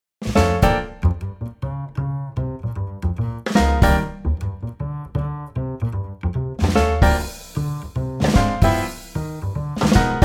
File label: Bb Trio